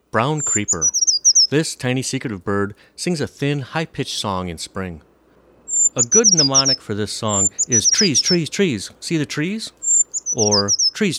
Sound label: Brown Creeper